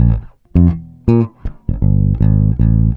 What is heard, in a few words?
bass, Loops Phonky